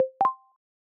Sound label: notification, ringtones